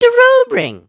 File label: just kidding, emotes